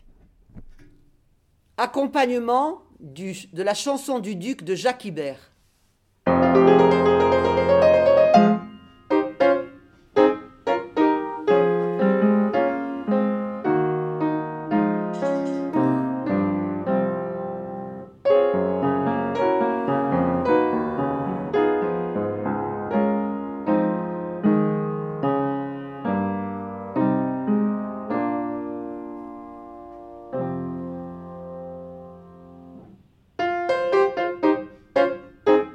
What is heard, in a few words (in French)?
Chanson du Duc accompagnement; Ibert